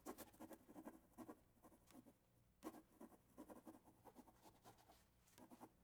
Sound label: Writing In Notebook; Fairlight Sound Lib